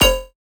Bass Stab Stinger F

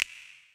TR kit, techno kit; Pro-Rec - Synthsonic

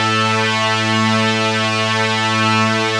OMNIVERB, ARP Synths, Analog Odyssey

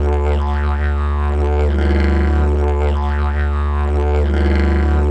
DIGERIDOO; Best Service; World Colours

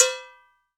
MAMBO BELL, Latin Hand Perc; E-MU Producer Series Vol. 2; More Studio Essentials; Composer

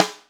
SN, Drum Machines

